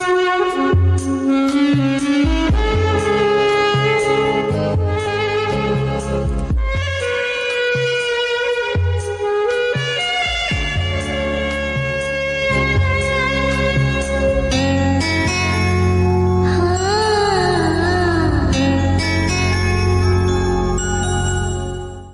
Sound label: Kannada Bgm Ringtone